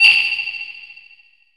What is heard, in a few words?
SYN BEL; AKAI S-Series CD-ROM Sound Library VOL-5; FX SYNTH BEL